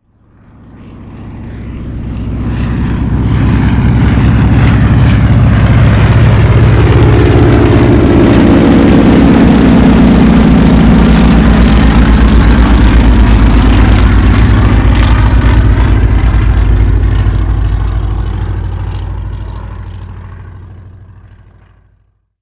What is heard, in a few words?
Plane Cargo, vehicle; mohaa